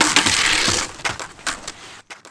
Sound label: bail runout flip, skater; Tony Hawk's Project 8; XBOX